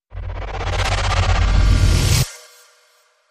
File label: Rev Squirm